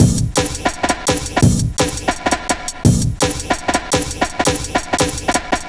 Old School, Jungle, Drum Loops 168.5

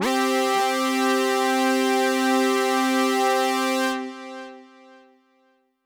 Synth C, Synth Prophet One Shots; Electronisounds

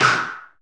SD, Clap; Sound & Vision - Gigapack I; Snap

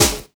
SD HIP; Best Service; Dance Mega Drums

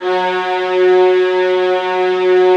violins G#, Synclavier Strings; ILIO

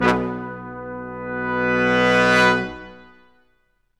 BRS QUIN S, Quintet sfz; Roland L-CD702

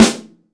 BRASS SNARE; AKAI S6000 CD-ROM - Volume 3

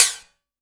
F#, synth line; 90 s Sample CDs; NiceBeats; SynthLine VOL-3

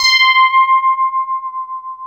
DS8 C 6, Korg; Back In Time Records; Korg Universe VOL-1

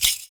FOOT PERC; Univers Sons - Raricussions

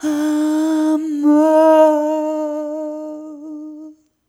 Rhythmic D

